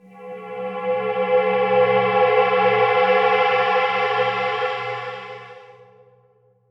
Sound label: VFX Atmos